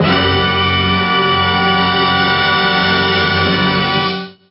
suspense, stabs

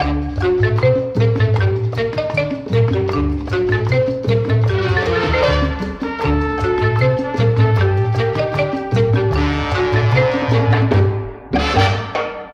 vibes and horns salsoid; Loops; Phonky

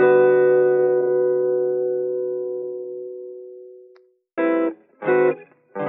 guitar, Loops Phonky

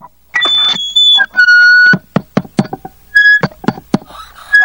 microphone

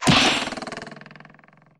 axe stuck; Crash Bang Boom

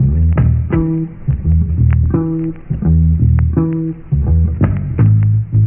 bass, Phonky loops

